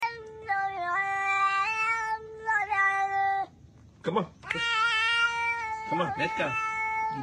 autotune cat

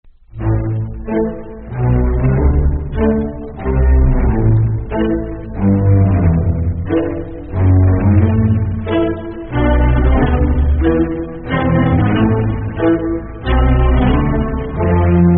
backround music

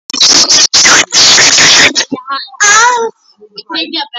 aah

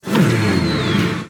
thermonuclear sounds; godzilla

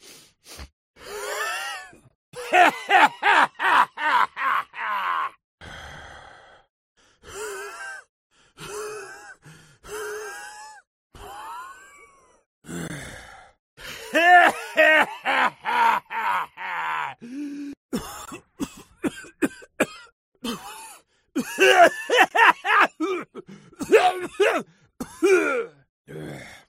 clown laugh; dbd

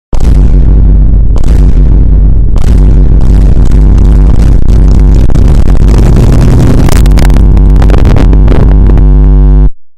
vine boom extreme bass boost

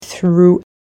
trill

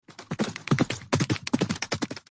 horse galloping sound

